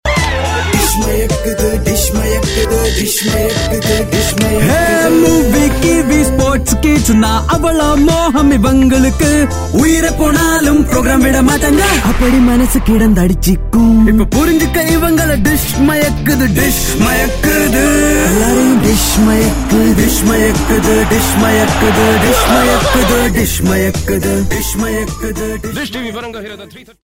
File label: tamil singing, male voice